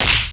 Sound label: slap; Crash Bang Boom